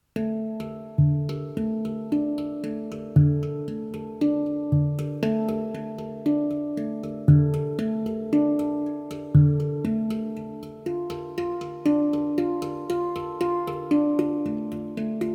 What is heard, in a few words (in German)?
klang einer handpan; Klangbeispiel eines Handpans